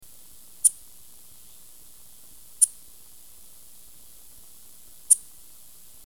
Microcentrum rhombifolium lisping; Arthropoda

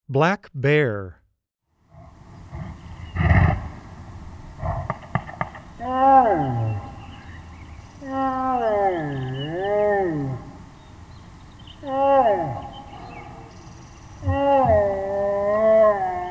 Black Bear, Animals Wildlife Sounds

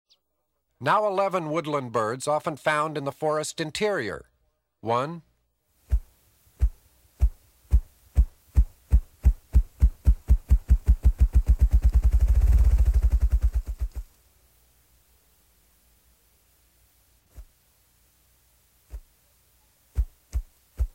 Forest Interiors, Birding By Ear

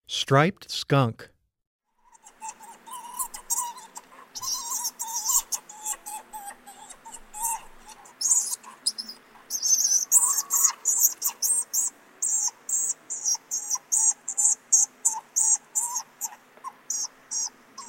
Striped Skunk, Wildlife Sounds

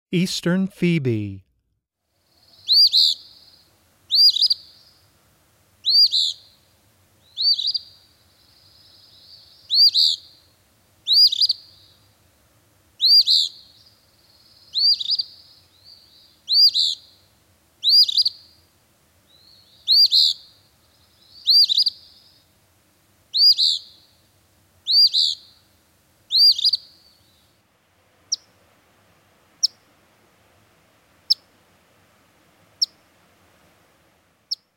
Eastern Phoebe, Wildlife Sounds

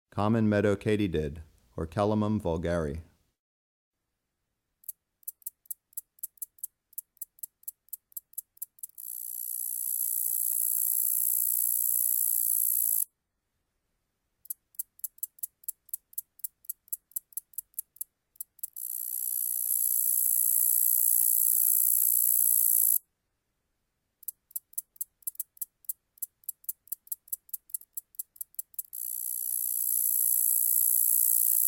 Common Meadow Katydid, Orchelimum vulgare; Crickets and Katydids

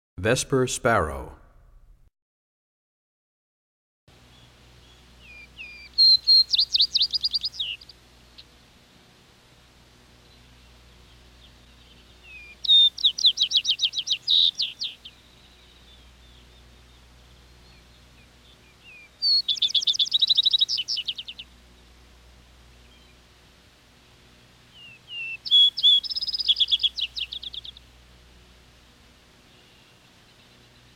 Vesper Sparrow, Bird Songs; Animals

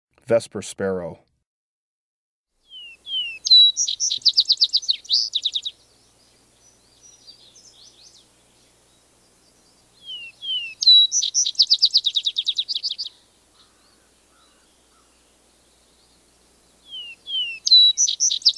Vesper Sparrow; Breeding Birds of the Chicago Region

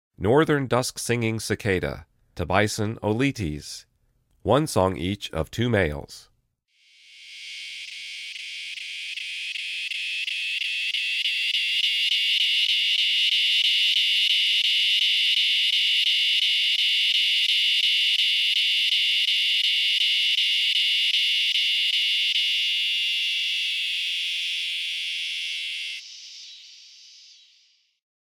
Northern Dusk-singing Cicada; The Songs of Insects

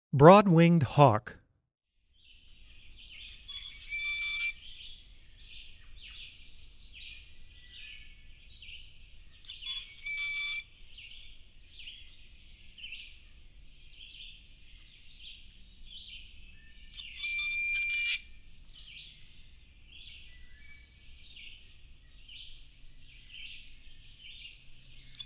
Broad-winged Hawk, Wildlife Sounds

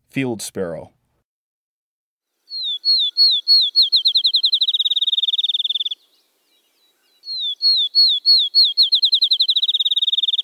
Field Sparrow, Birds of the Chicago Region

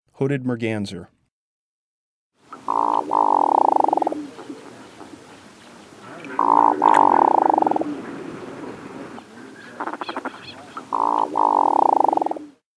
Hooded Merganser, Birds of the Chicago Region

